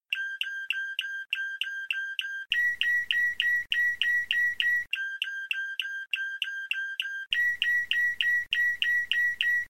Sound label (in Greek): καμπάνα, timbrado español; θετικές νότες